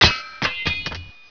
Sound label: gib metal b, gibs; quakemapping